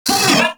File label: Moa Vocal Pain; Warframe